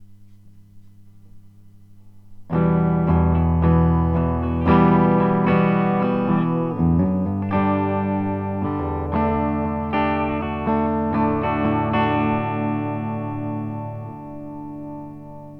rev clean angezerrt

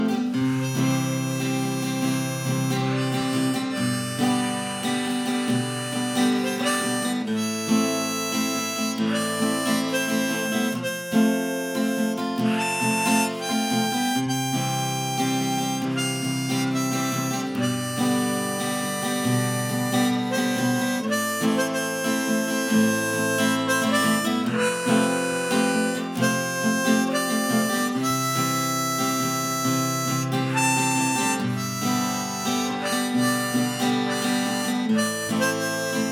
harp atmung

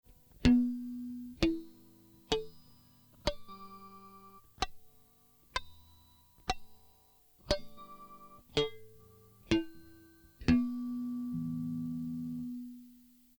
oberton

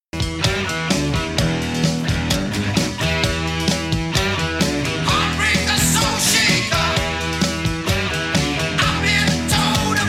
Stimmungsvergleich

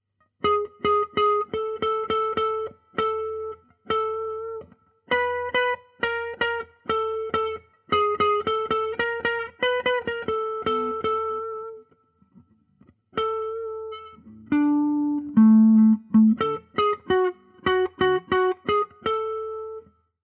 klingeln

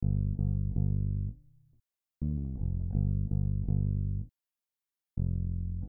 technik finger am halstonabnehmer ohne abdaempfen

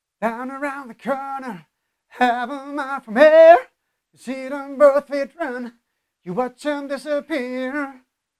gesungen

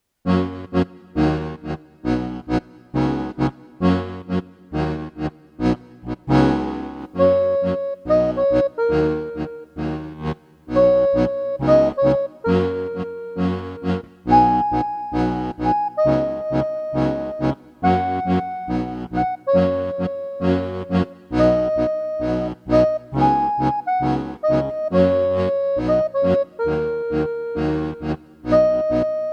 pentatonik

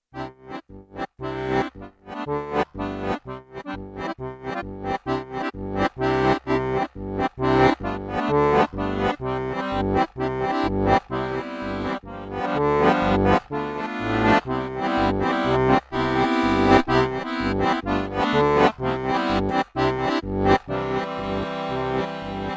haenschenklein var4

